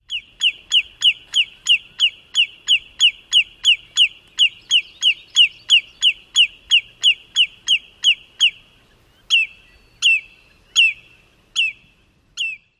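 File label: Lesser Yellowlegs, perch alarm calls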